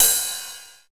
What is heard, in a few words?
TR-909 Ride; Roland BK-5